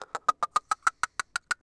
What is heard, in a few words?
squirrel; Tony Hawk's Project 8; XBOX